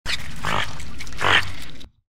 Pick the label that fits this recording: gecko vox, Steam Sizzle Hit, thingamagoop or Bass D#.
gecko vox